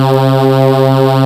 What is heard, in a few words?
ARP C4; Kid Nepro; Elite